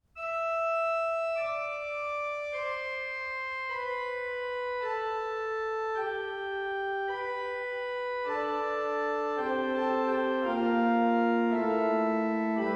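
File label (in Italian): canoni, organist; Canoni RPS (2017); 2) Canone secondo RPS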